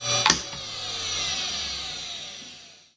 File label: boss down